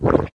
toxic slime gurgle; ambient; canals; source engine; hl2; video games

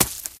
gravel footsteps, infected walk; player; source engine; left 4 dead 2

